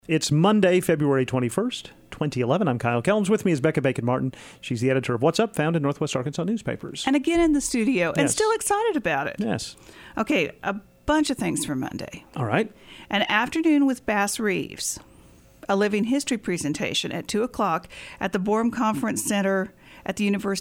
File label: Live Music and Packed Monday; Bass Reeves